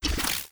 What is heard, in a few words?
fire block